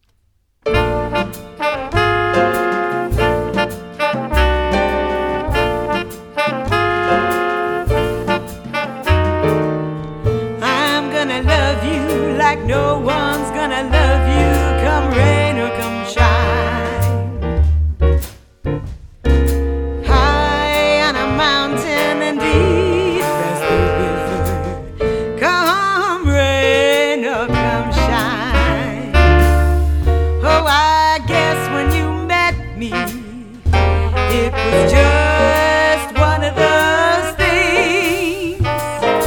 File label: Jazz Band; Orlando